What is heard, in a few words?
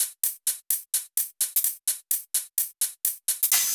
Hihat Mix, 128 BPM; Vengeance Samples; Vocal-Hook Kits; Song Kit; Keep Believing